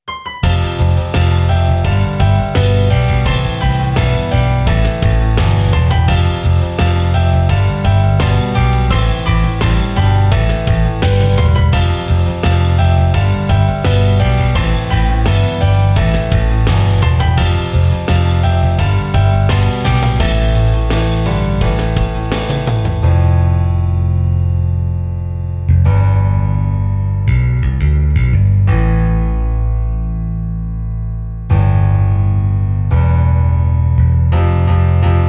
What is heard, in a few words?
rain off vocal